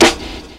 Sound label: sizzle hip hop acoustic snare sound e key